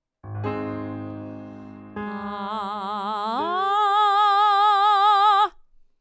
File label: Octave Slide; Exercises for Nasality